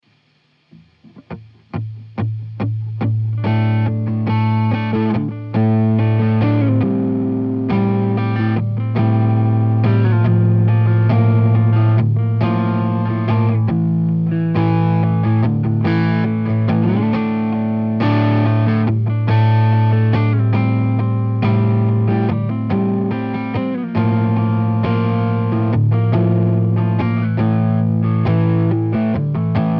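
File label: D 140 guitar groove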